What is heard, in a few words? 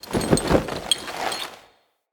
Idle Screen Foley Slow; Kuva Lich